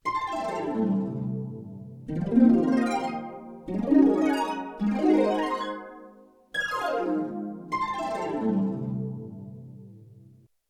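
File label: Stereo Harp Glissando; Harp 2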